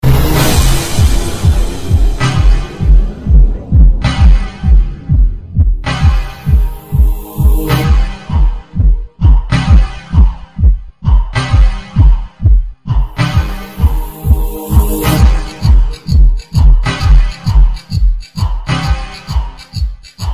german techno; ringtone

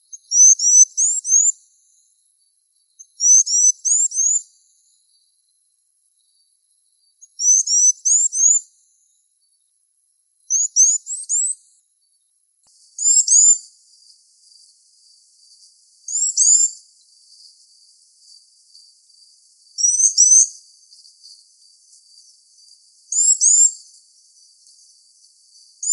african blue waxbill

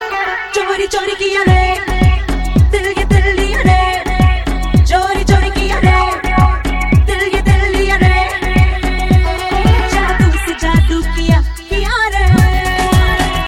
remix; dhoom 2

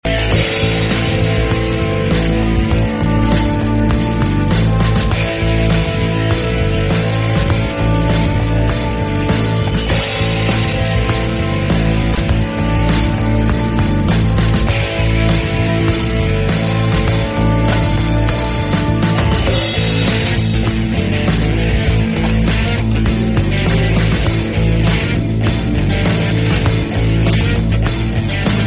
theme, ringtone; lakshya

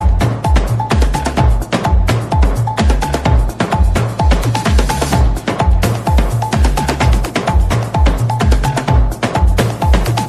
brazil party ringtone

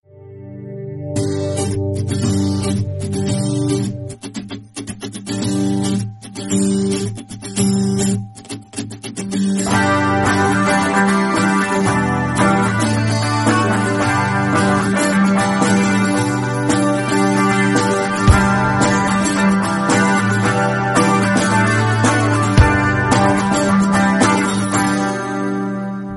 guitar, ringtone

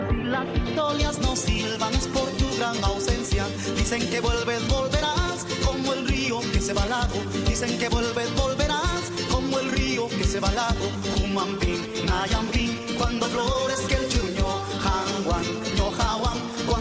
latin beat ringtone

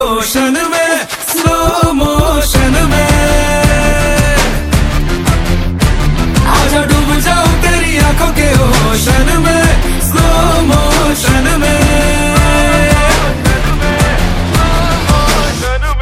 hindi ringtone

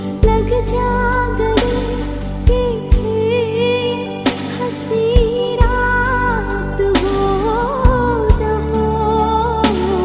sweet voice

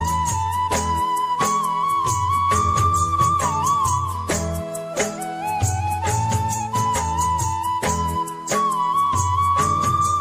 folk rajasthani ringtone

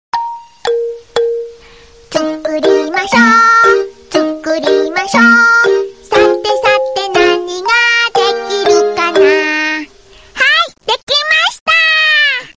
chinese baby sms

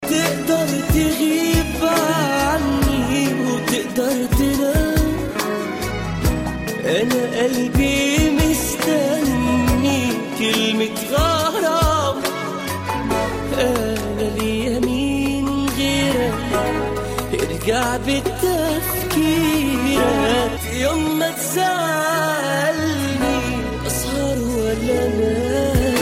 arab very sad

